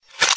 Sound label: loading middle, crossbow; minecraft